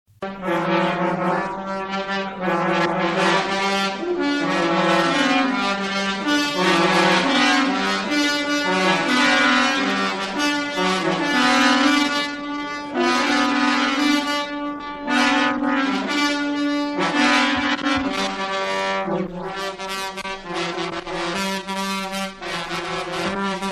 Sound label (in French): Trompette